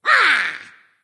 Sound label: battlecry little dragon; fafnir